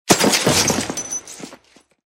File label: roll